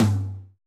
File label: tom, Roland MC-909